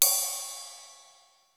RIDE, CYMBALS; Crypton - DrumBase5000

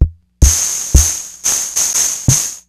Seeburg Rhythm Prince

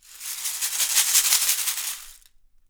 shaker fx; Univers Sons - Raricussions

